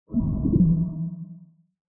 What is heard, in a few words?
Underwater Magic Effect; SoundsCrate